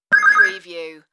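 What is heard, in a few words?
Video Game Sound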